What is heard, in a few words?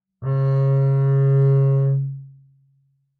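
Bass arco fortissimo sul A Db2, Double Bass; MIS Pitches - 2014; Strings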